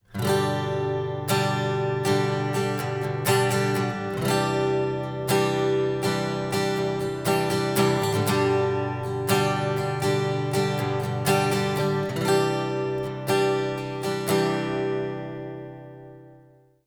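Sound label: sm58 at2020 stereo mix, acoustic guitar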